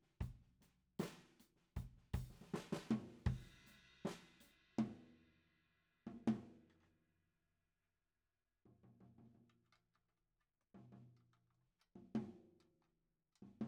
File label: KIT